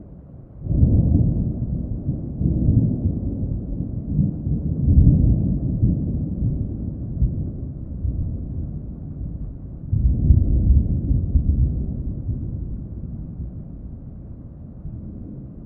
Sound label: Thunder Strike